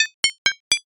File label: pinball